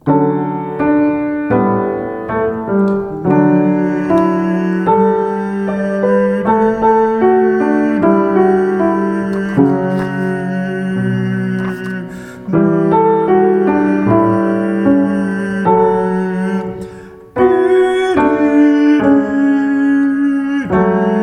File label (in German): Tenor; Sternen Carol; adventsmusik